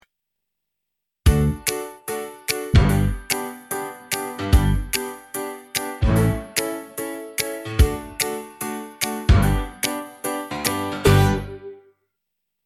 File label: x-maths Instrumental